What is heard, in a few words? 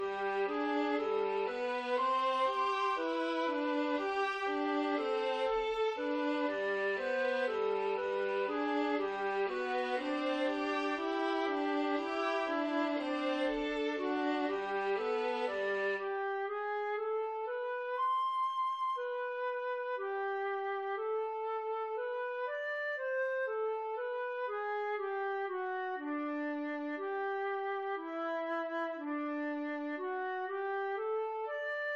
bird chirping flute midi